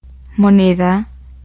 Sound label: coin; gcompris-data